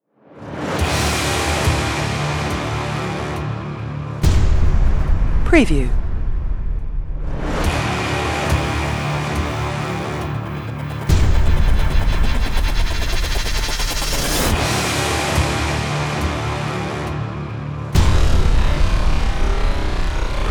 Dark Apocalyptic Horns Braaams Impacts Trailer Opener Intro